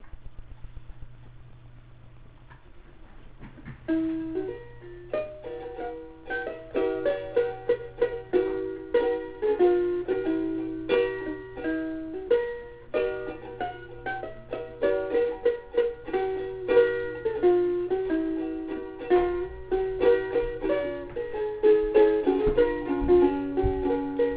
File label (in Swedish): Polska; Nackens